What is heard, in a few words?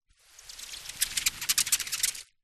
bubble wrap sheet laid flat finger hand rub across squeak